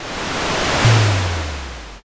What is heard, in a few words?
fire magic